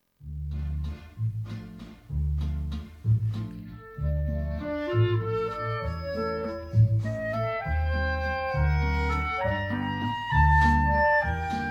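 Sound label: backing track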